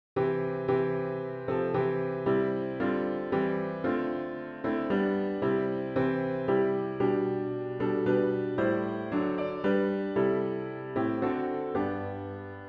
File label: piano only; We Gather Together